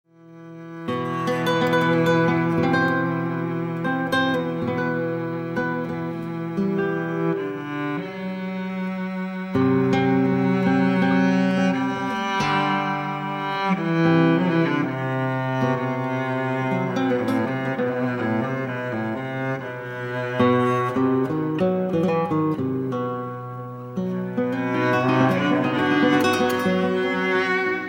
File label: iberian improv